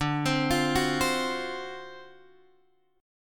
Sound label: D 7th Sharp 9th, Guitar Open G